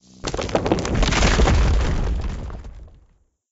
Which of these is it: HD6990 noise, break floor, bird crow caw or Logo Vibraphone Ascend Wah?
break floor